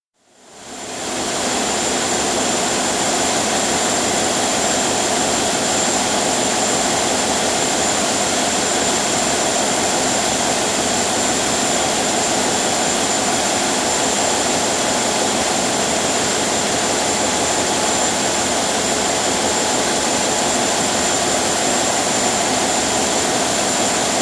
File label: Ships Engines, Car Ferry, atmosphere in engine room; Car Ferry St Nicholas, 17,400 tons, built 1980; Boat; BBC